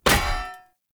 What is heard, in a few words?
Polearm Medium Impact Default, Weapon Impacts; Player; Environment; Sphere Polearm